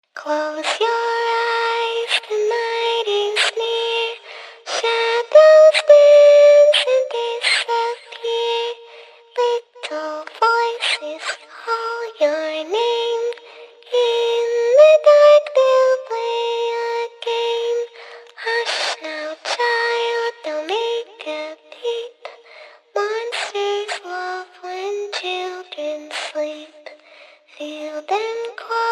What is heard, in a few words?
creepy little girl singing a scary song